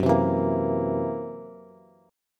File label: Fsharp 7th Flat 5th down Bass